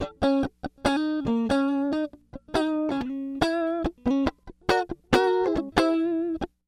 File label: 070 Pop Guitar S-Note Rthm C# Min, Electric; Pop Rock; Electric 055-099 bpm; Pro Tools Essential Loops; Digidesign